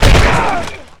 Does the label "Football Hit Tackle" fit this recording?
Yes